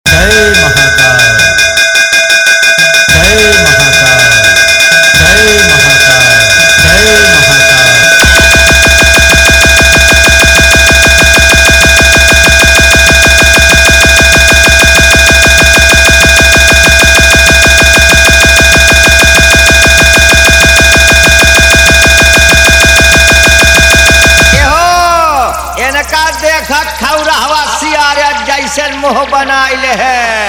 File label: Ghanti Power Tapori Compation